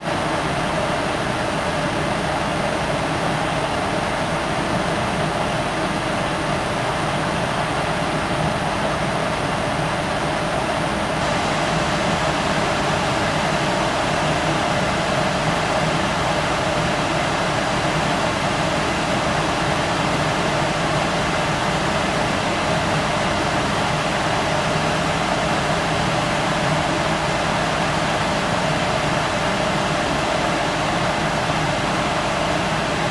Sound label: industrial gas boiler room room tone boiler near; sonniss gdc